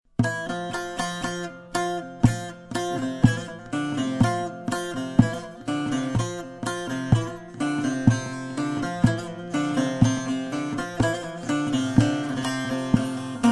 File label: saz; semiprof dut saz